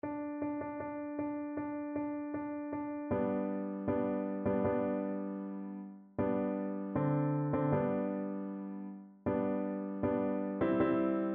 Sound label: vn pf; wagner; marsz weselny